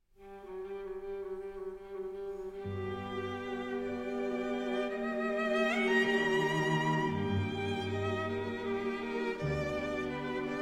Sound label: String Sextet No. 2 in G Major; Op. 36; Brahms